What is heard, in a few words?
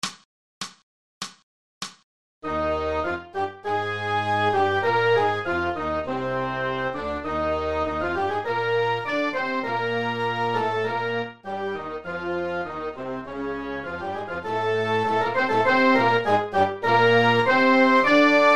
technical etude